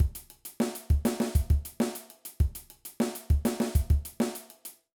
Groove Example, drum

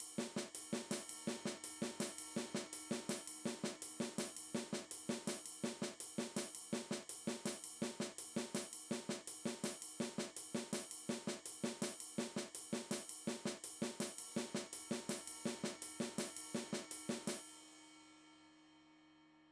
Snare Comping Shuffle

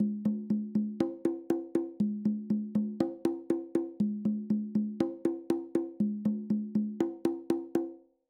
Conga Pattern

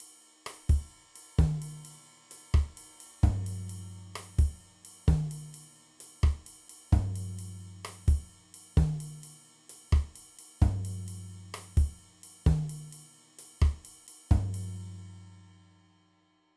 Salsa Beat

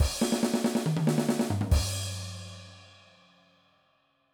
Punk Fill, drum

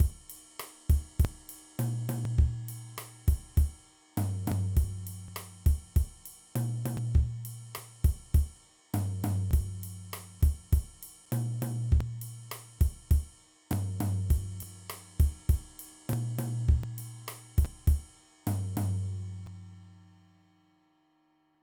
Mambo Beat, drum

